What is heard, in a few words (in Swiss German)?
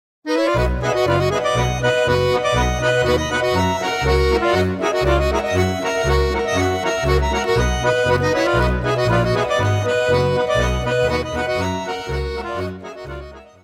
Chlefeli Schottisch